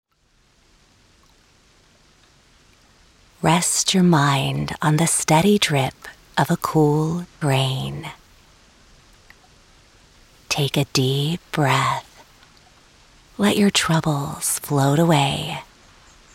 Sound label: Water; CLEO Institute; Fire; California